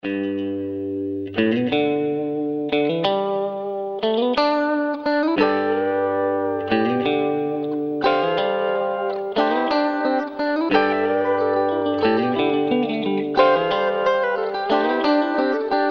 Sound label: Country Guitar For Beginners, guitar; Lesson 4